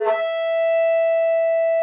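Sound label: bassoon forte E3; Xsample VOL-6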